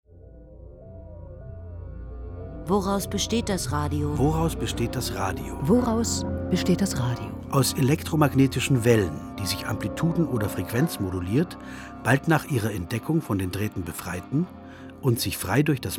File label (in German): Choere Trailer